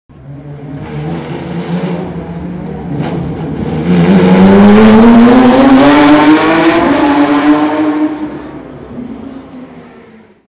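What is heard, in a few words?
Rally Car Pass through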